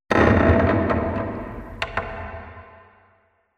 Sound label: wood creak low; Half Life Alyx